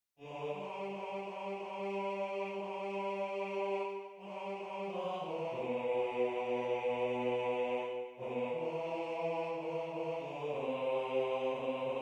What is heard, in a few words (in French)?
basse